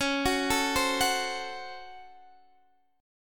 C sharp Major 7th Suspended 4th Sharp 5th, Guitar, Open D